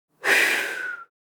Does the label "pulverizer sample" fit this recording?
No